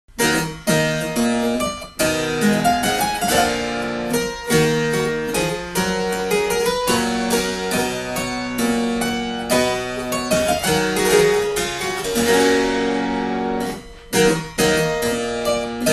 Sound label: pisa harpsichord